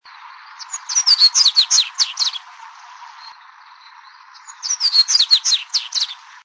Corbatita comun, Corbatita Común (Sporophila caerulescens)

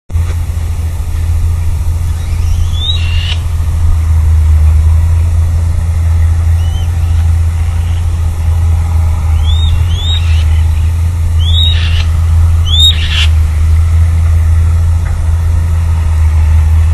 Elanus leucurus Pichones; Lujan

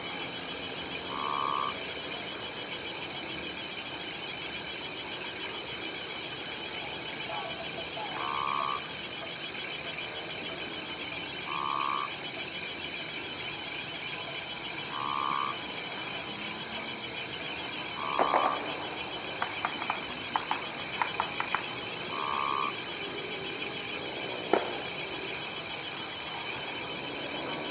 Odontophrynus y festejos calle; Chajari; calle Irigoyen

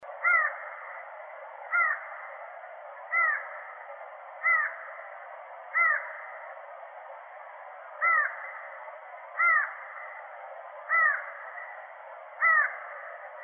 CHOCA COMUN, Choca Común (Thamnophilus caerulescens)

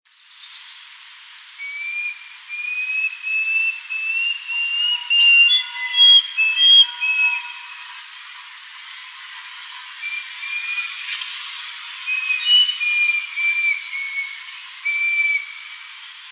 CARANCH JUVENILES LLAMANDO

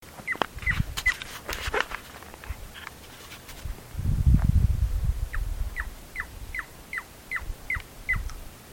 Gallito Arena

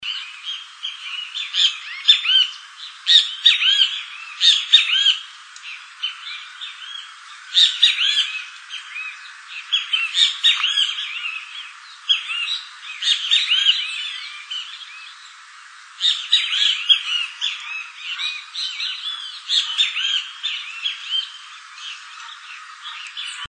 Benteveos; sony

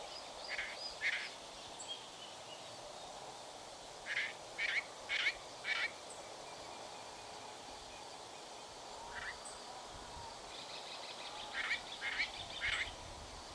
Calandria; Chajari; Inm Perc y 25 de mayo

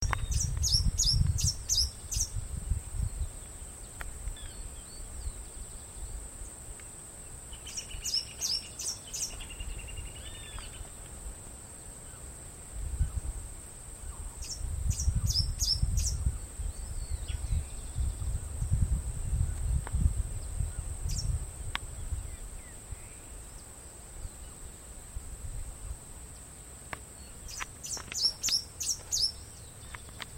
Naranjero, Naranjero (Rauenia bonariensis)

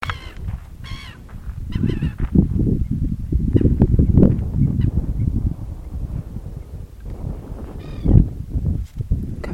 Gaviot

